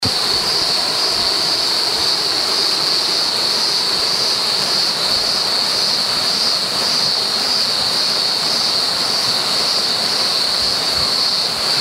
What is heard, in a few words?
Waterfall; henry angel